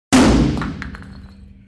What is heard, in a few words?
brick metal hit sound